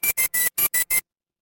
feedback confirm

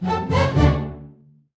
feedback level up

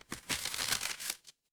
paper fabric crumple

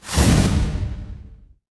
magic scifi teleport

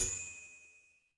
office elevator

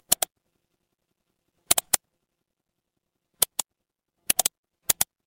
office mouse click

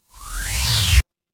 transition sweep

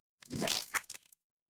cartoon splat

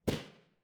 impact gunshot

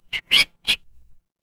cartoon squeak

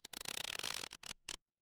cartoon stretch

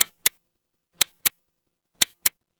mechanical clock tick

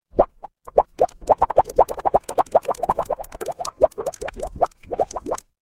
water bubble

